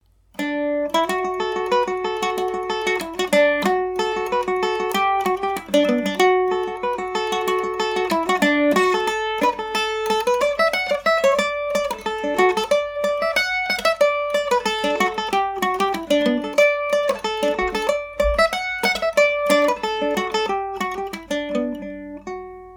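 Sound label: D major md304, irish mandolin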